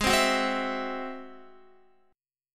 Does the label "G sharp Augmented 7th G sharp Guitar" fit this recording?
Yes